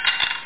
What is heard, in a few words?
bicycle chain